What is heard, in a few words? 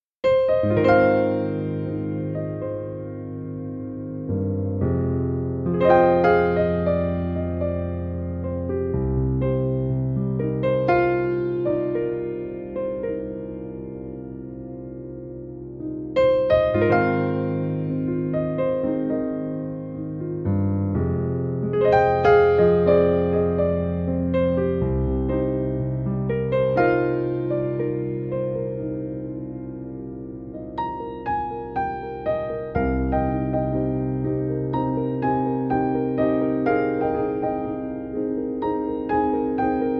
Piano Version